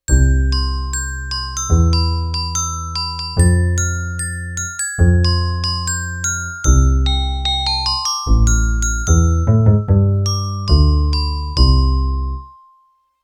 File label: Bass Glkn